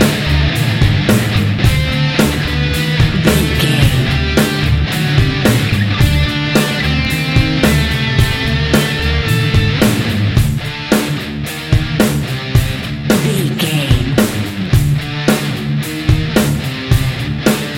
frontal attack

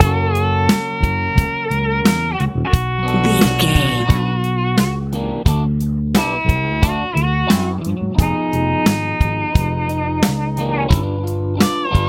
blues rock

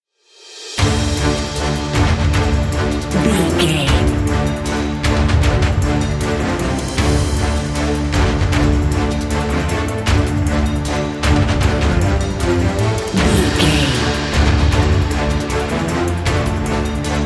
epic boss fight

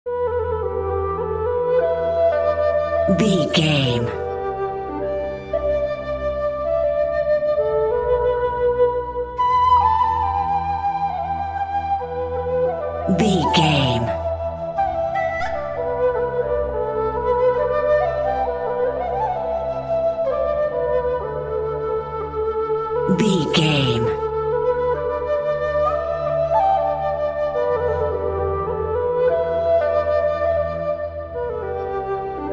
xiao melody

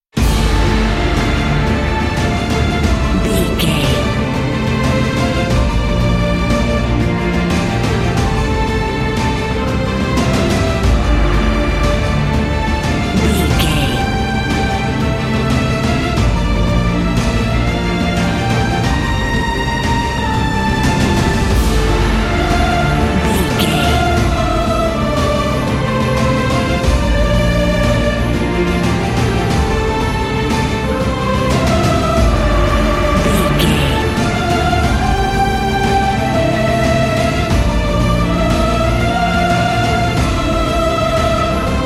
epic cinematic

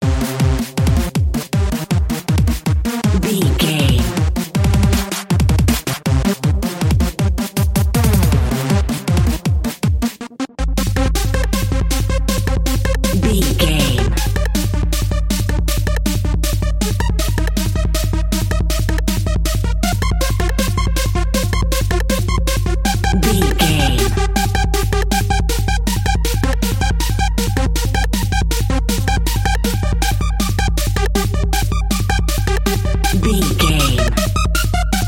acid head

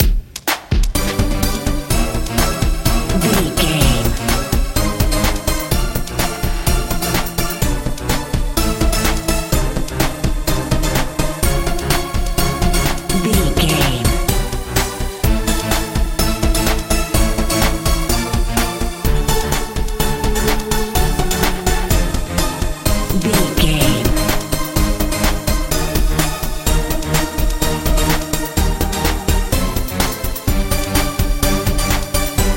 euro dance

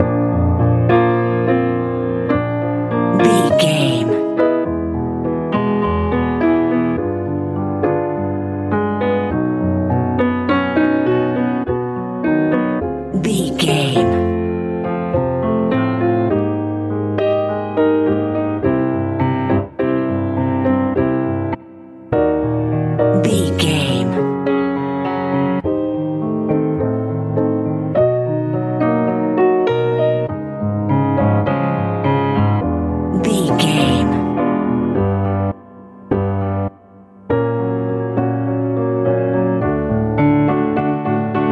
piano ballad

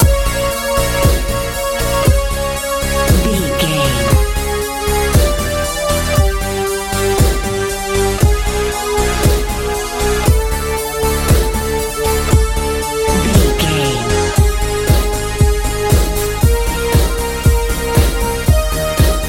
colorful nice techno

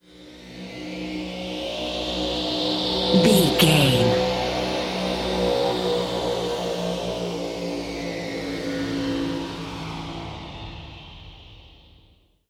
ominous war plane heard small cavern

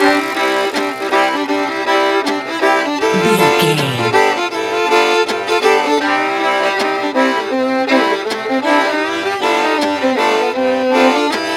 happy cajun boat trip 795 bpm loop

